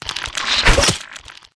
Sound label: crag crab attack, monster